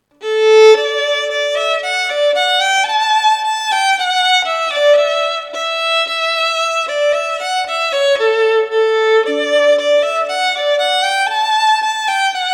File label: violin; Simple Gifts